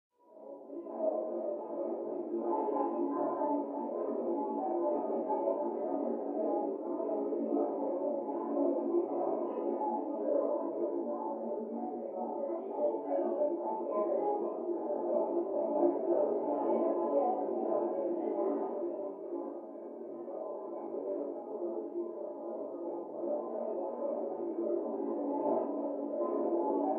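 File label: Party Medium Adult Walla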